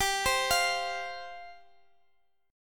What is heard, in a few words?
F 5th G, F5/G chord; F5/G arpeggiated